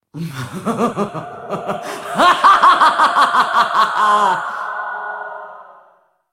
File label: laugh; Black Ice Anivia